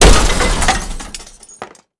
jeep crash hard

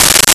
Tamborine; Nintendo; July 2020 Gigaleak

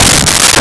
Gun Shot; Nintendo; July 2020 Gigaleak

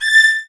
Fast Str a6; BGM; Nintendo; July 2020 Gigaleak

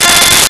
Bandoneon F5; Nintendo; July 2020 Gigaleak

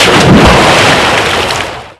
jump to water; Nintendo; July 2020 Gigaleak

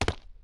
jump, footsteps hard surface; grunt footsteps

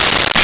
Guiro; Nintendo; July 2020 Gigaleak

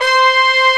Trumbone c5; BGM; Nintendo; July 2020 Gigaleak

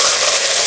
dirt; Nintendo

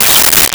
Fret Noise; Nintendo; July 2020 Gigaleak

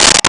Mute Conga; Nintendo; July 2020 Gigaleak

